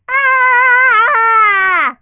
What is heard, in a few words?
aaah